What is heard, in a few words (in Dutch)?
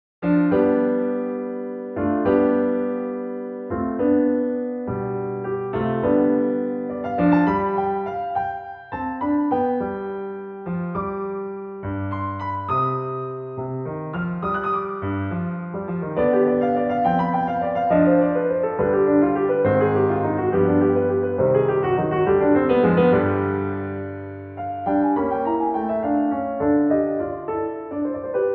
pianobegeleiding